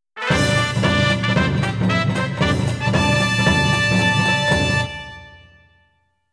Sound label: victory jingle; tokimeki memorial 2